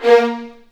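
A#3, The Ultimate String Collection, violins marcato; East West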